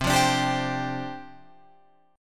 C Suspended 2nd Suspended 4th, down, Guitar, Open F; Csus2sus4 strummed